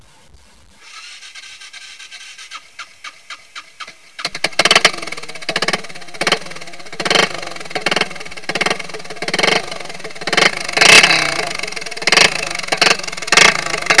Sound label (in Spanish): sonido moto